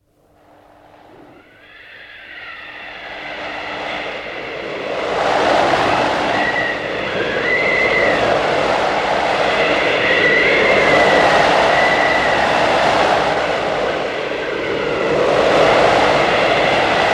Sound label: A blizzard; Snow; Weather; BBC